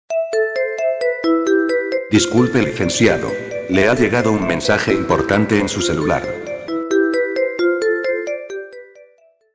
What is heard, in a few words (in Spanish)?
mi mejor tono de msj